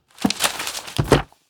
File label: Parchment Book Open Death Underlay